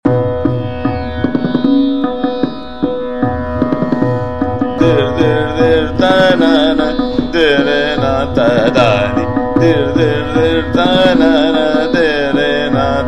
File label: Tarana, Dir Dir Dir Tanan, Ektal Drut; Jaijaivanti